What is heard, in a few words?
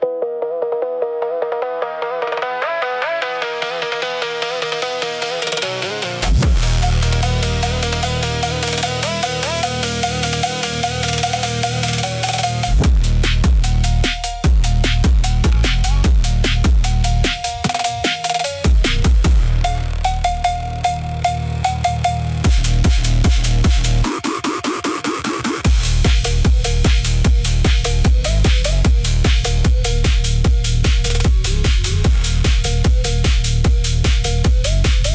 Nuclear Cowbell Assault 150BPM